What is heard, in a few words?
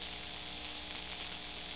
Electric